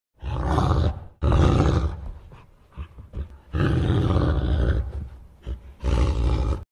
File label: wolf growling; loup grogne